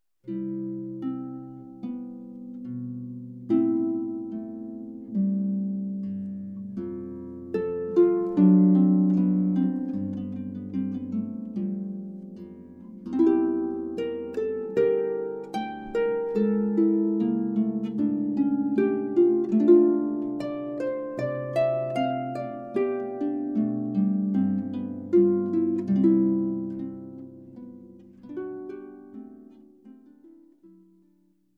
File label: Air from G String, Harpist